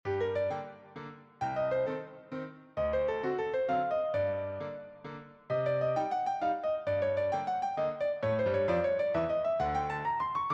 Waltz in G minor

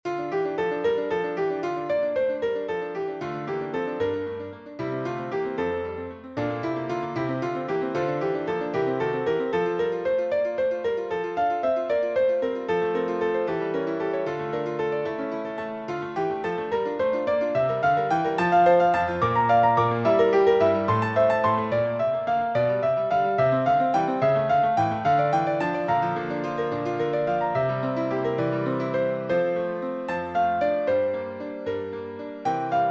concerto in D minor Toccata

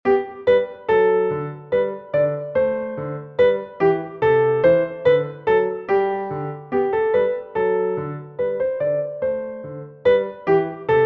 gavotte; Dances Songs No 5

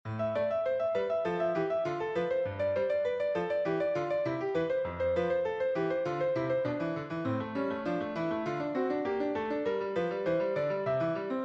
Toccatina in A minor

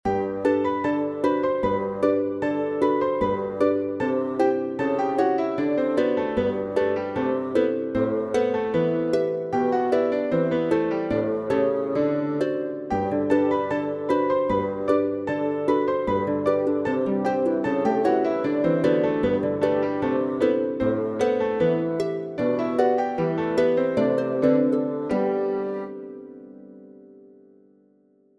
Gavotte; pour les enfants

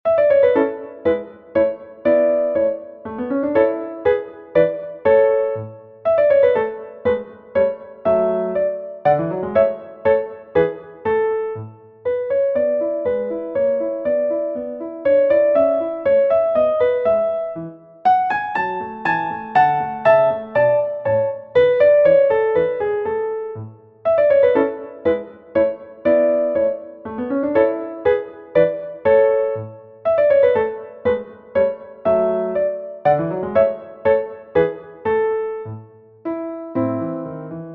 Minuet and Trio in A major

